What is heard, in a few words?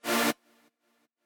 chord